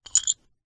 metal sequence slide gear change mechanical gear mechanism accent; ancient game